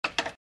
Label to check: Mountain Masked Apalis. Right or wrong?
Wrong